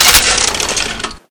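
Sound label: machine gun ammo belt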